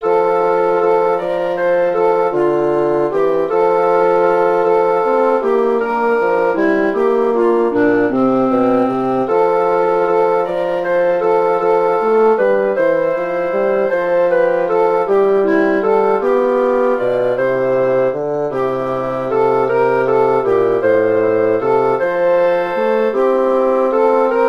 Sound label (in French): 3 voix